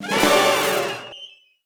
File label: Combo Hit; Trapped - Ken Combo 1